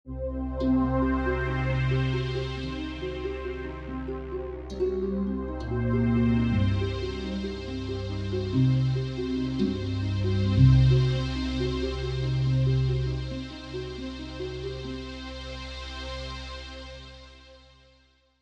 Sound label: Sweeper Swell Dark Mysterious; Musical; Blastwave